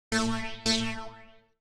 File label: TEKNO 140, Techno; Techno2; Sphere